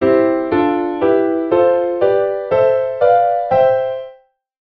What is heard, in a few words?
Diatonic Major Chords